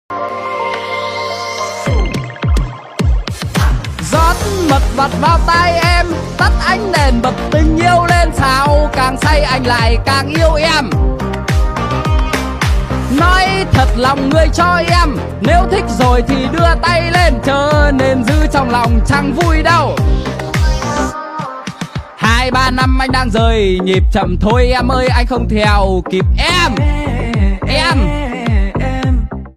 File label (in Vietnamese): nhac chuong bao thuc; mixi